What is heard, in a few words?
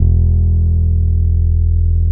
DUB BASS, Electric Basses; PlatinumPhattCD2; E-MU Producer Series Vol. 8 – Platinum Phatt